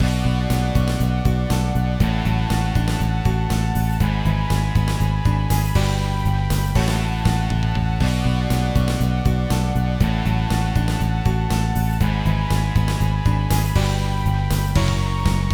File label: Pop Stage Karaoke; pinky street kira kira music hour pop princess gamerip